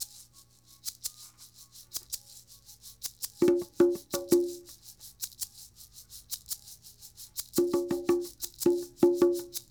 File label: Shaker Conga